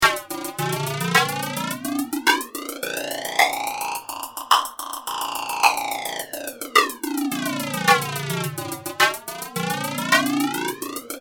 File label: flanger